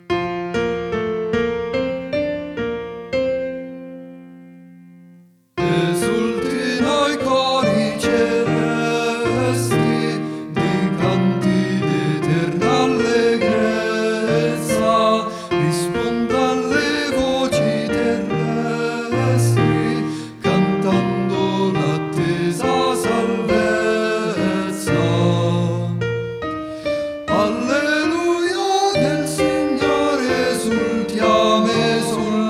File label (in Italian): TENORI